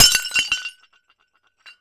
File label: plate